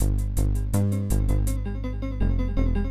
Forest Start; Mission in Snowdriftland

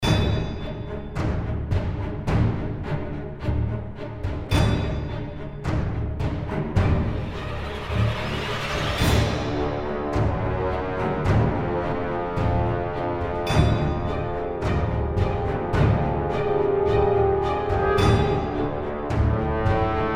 Aggressive Battle Loop; Earth Atlantis

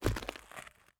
ice land; teardown; ps5 windows xbox series xs

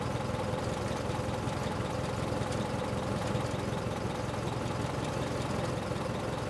vehicle forklift idle; teardown; ps5 windows xbox series xs

